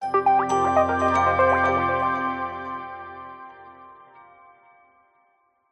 logo musical short warm delayed tech or medical; zapsplat

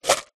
pebbles many in plastic pot shake toss single; zapsplat

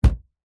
foley balloon inflated valve pull release thud

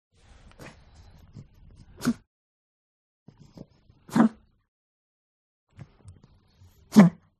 DOG Pug Smack Gag; ANIMAL; smartsound

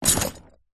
horse saddle leather metal set down jangle

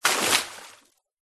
nature water pour medium amount deep sudden fast

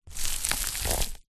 industrial sandpaper sheet movement

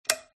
open switch light; fox audio

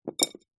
wood sticks skewers x2 drop carpet

